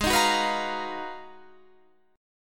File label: Ab Minor 13th, Ab, Guitar, Standard; Abm13 strummed; JGuitar